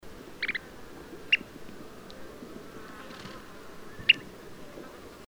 kenplov alexandrinus; kocsu aue

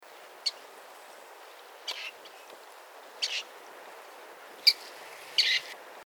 STEHIR JUV CKIT CKRIA; helgoland

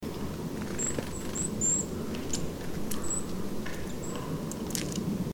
ectit mitratus FC; reudnitz

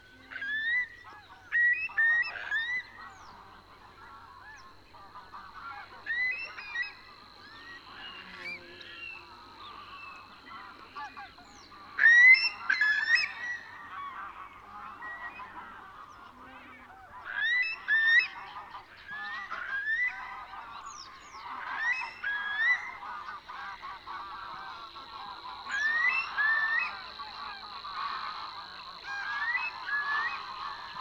ecurl arquata; karrend wiesen

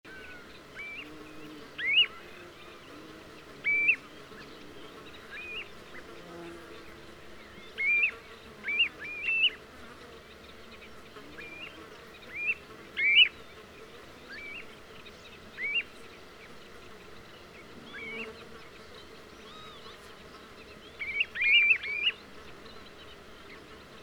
crplov AD FC JUV FC; karrend wiesen

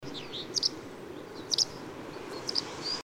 pwagt M FC; mounthooley

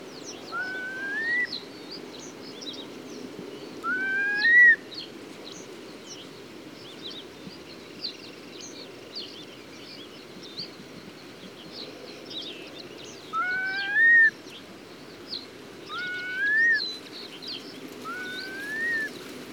ecurl orientalis; astrakhanka

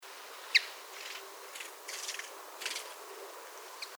cchaffin coelebs FC

